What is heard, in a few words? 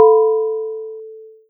gong; simongame